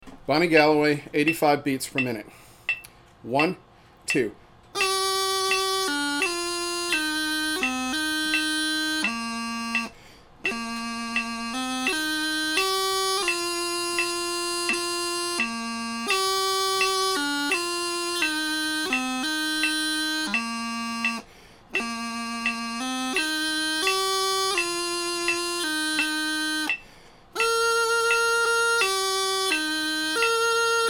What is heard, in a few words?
85bpm, bagpipe; Bonnie Galloway; 4/4 March Set; Jerry Finegan